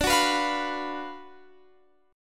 F sharp Major 7th Suspended 4th Sharp 5th, D, chord